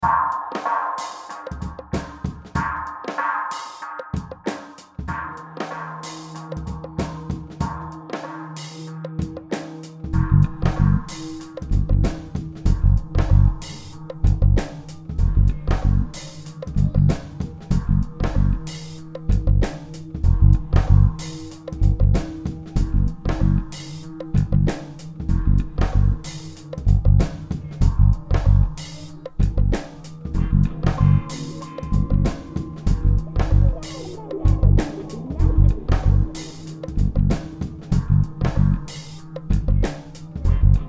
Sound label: Funky Sexy; Blackgate; macOS; Windows